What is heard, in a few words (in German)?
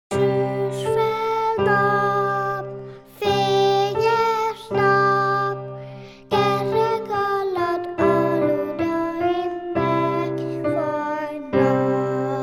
Ungarn Schülerin Song